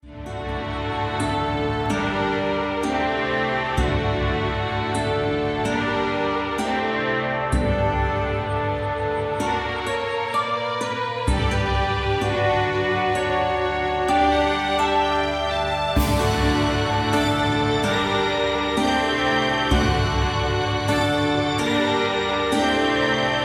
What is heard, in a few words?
Mystery Fantasy